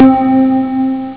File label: dripp; Amiga Soundtracker sample